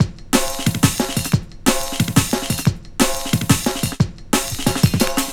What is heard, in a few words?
Rugged Break, Drum & Bass, Beats & Breaks